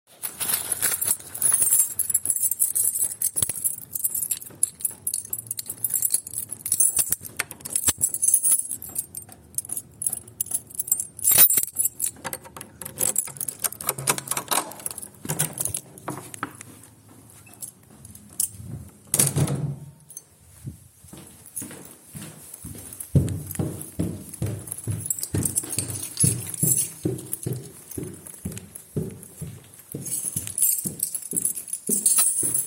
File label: keys & stairs; Rotterdam Field Recordings